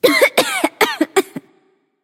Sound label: clearthroat; charybdis